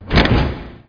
latch; CD Action; shadow

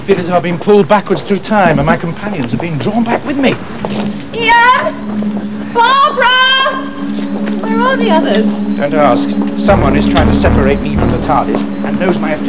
Affinity, Amiga 8-bit Sampled Vo

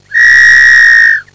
police whistle

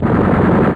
Guns; BOMBER III™ (DEMO)

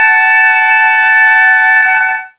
starting klaxon, Car; F1 2002

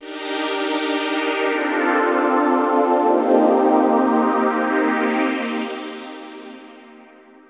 SYNTH PAD AMBNT 160 C, Ambient; eJay; Sphere

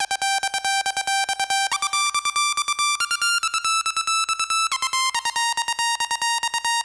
Tranc, Techno Melody; TechnoMusicMaker

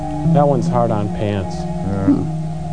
hard pant; mst3k

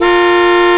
CAR; NFS3 Cars; Vcop

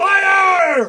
fire; Civilization II

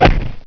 HIT; The Ultimate Guide to Mortal Kombat

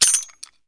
coin caught; Pop the Wheezil

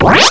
Yummie Appears; Apeiron

